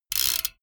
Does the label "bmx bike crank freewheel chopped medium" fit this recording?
Yes